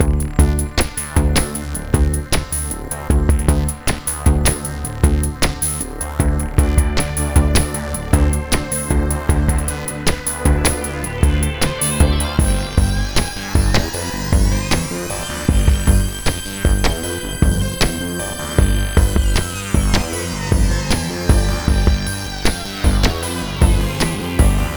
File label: music